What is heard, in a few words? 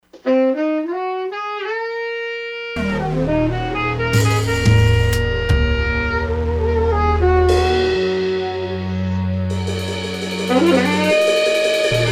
Dreamy Sax